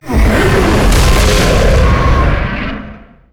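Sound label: Boss death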